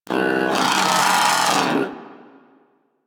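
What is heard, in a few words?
Moa Aggressive Vocal Disagree; Warframe; Pets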